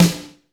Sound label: SD LO HALL, snare drum; SNAREDRUMS